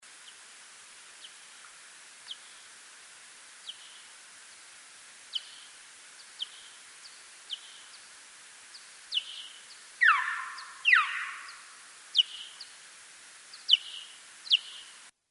Social Pallid Bat; YakimaRiv MP13

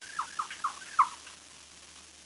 Spotted Bat; MosesCoulee

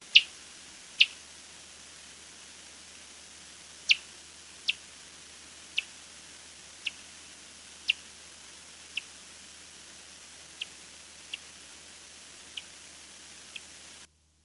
Fringed Myotis, bats; JamesonLk

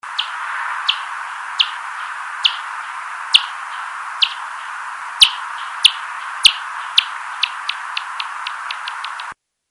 Fringed Bat; GateCity

